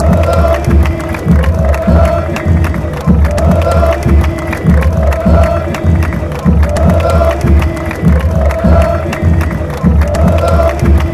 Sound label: chant, argentina; atlanta